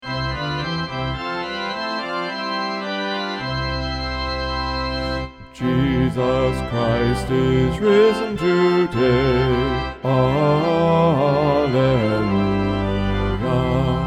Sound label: Jesus Christ Is Risen Today bass